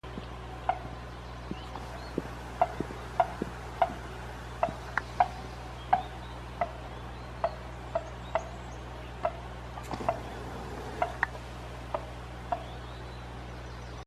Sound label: putt